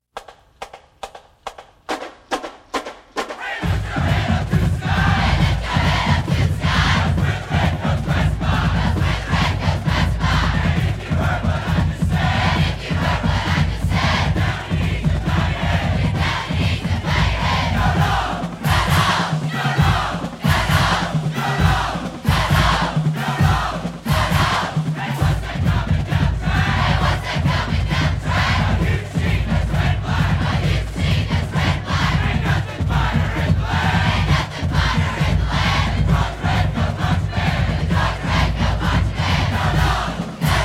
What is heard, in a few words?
Band Chant; Redcoat Band